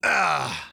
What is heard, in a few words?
Grunt Hit; paladins; Lex